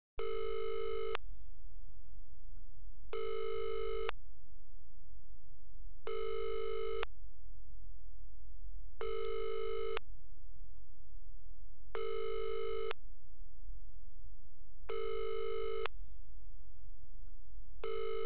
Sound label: Phone call sound; telephone